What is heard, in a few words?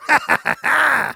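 laugh happy, German; Heavy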